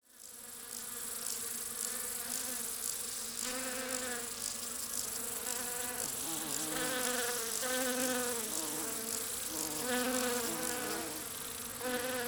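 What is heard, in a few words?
bees and crickets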